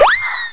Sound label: whee; The Cyberplasm Formula; Sanctuary Woods